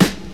old school boom hip hop snare one shot F sharp key